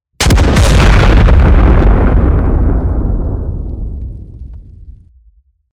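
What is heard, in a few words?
sound of explosion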